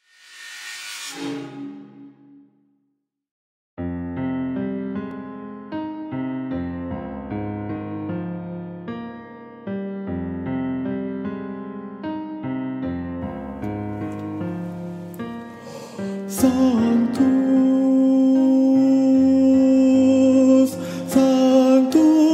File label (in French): Sanctus Guide voix Sopranos